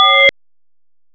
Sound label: SYNBELL; BELLS; M8; CMI Factory